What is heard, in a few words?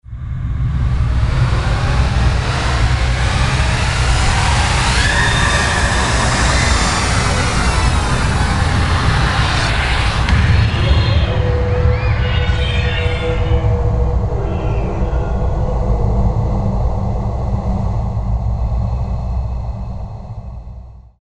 monster birth